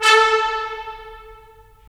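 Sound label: brass trumpets staccato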